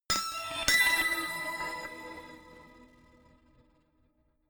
notification glockenspiel crazy fifth up

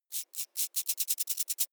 creature movement tiny; bewegung; comedy-cartoon; soundpool

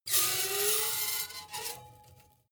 metal screech phaser edgy short

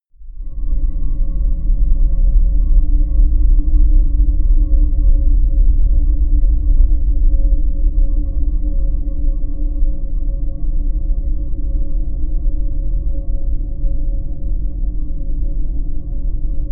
drone deep and calm

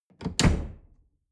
dishwasher door snap open

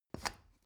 step ladder wood scrape hard single; schritte

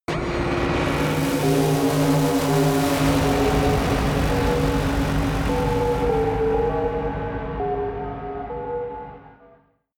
buzz crying bells high; musikelemente; drones

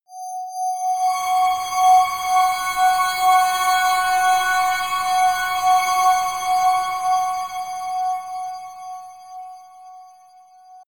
scrapes ringing blitter harmonic

